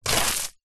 stick scrape line deep dirt; zapsplat; foley

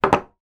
household torch flashlight maglite down on table; zapsplat

